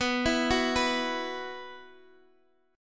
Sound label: B Suspended 4th B Guitar Open D, chord